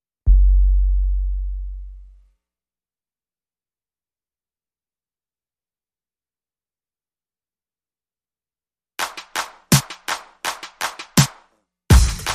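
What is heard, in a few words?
drums and percussion only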